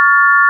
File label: sine; 音の波形と分析